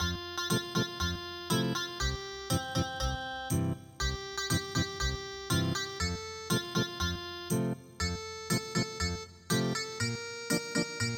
German Festival Ethnic Guitar

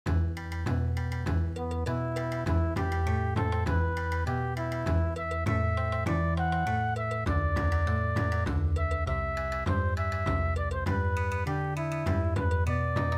Bon Odori

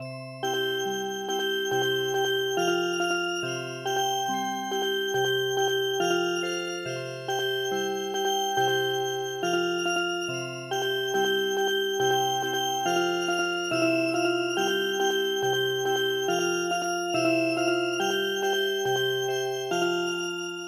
Music Box

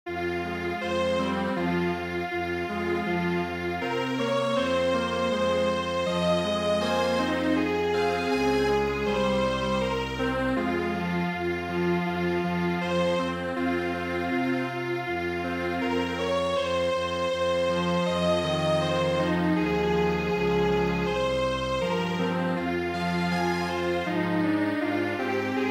lullaby Strings Quartet; traditional

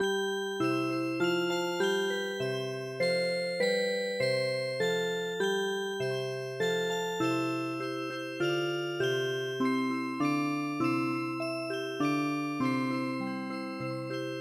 Music Box; ドイツ民謡の「ほたる」に癒し系のアレンジを施したオルゴール曲を聞くことができます。; 「ほたる」は、ミディアムテンポな3拍子のドイツの伝統曲です。

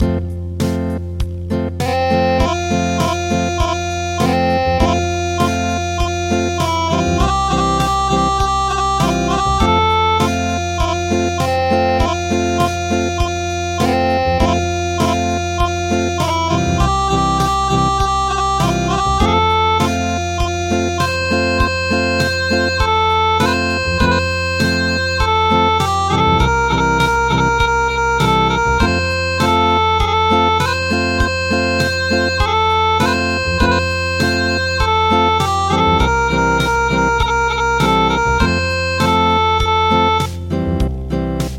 Acoustic, midi

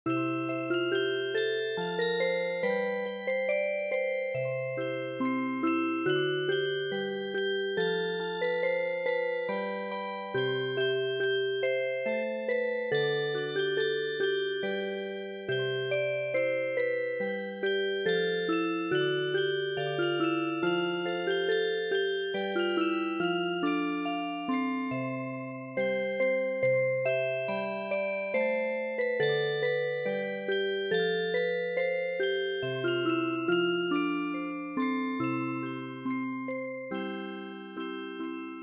Music Box; Tarltons Resurrection

